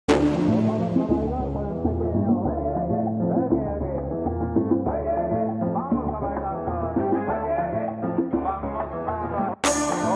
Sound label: trap beat mix